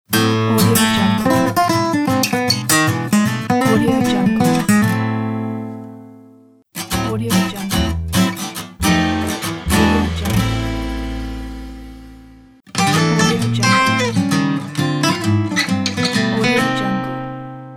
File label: gypsy swing logo